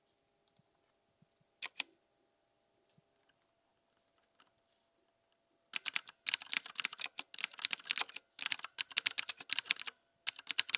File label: tenkey click tactile, keyboard